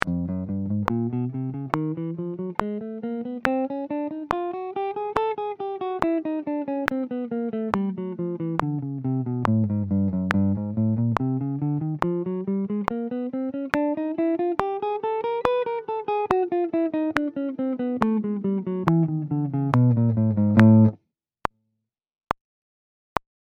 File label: Alternate Picking Sixteenth Notes